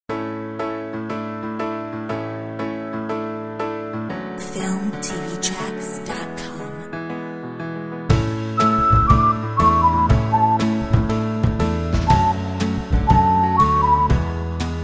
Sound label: Summer life whistle; whistling and happy music track